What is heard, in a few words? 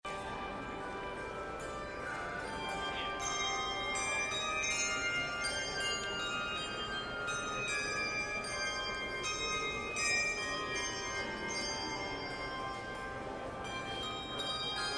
Prelude, Carillons